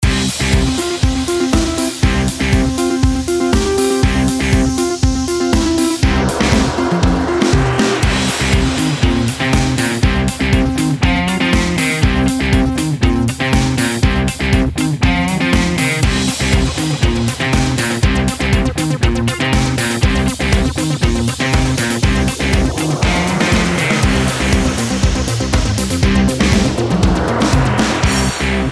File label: Electro Disco